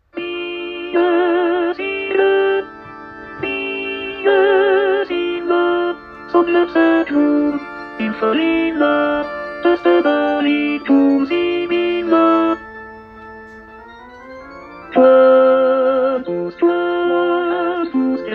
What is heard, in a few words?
tenor dies irae